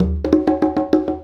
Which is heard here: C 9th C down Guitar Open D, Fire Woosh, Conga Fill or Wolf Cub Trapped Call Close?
Conga Fill